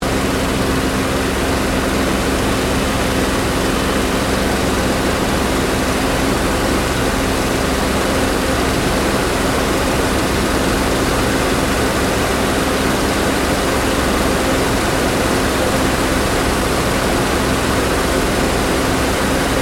AIRCRAFT SUPERMARINE SPITFIRE MK-VIII FLYING INTERIOR; Airplanes; Airplane Sound Effects